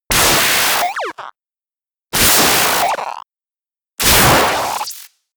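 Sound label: glitch, electronic; comedy cartoon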